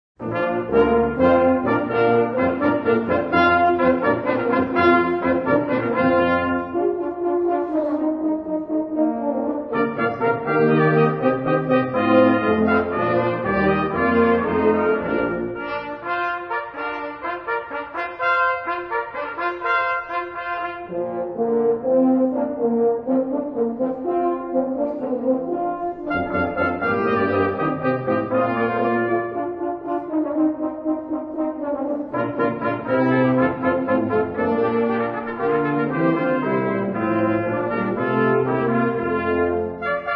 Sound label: HornPipe; Händel: Water Music Suite, No.2 for orchestra in D major, HWV 349: Overture, Hornpipe, Air and Lentement